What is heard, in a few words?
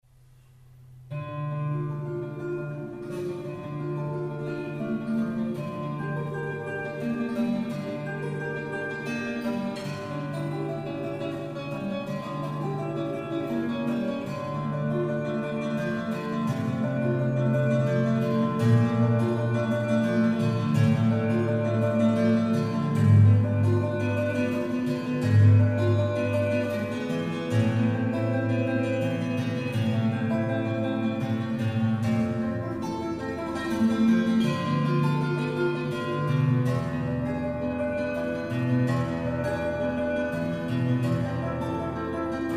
preludium, gitara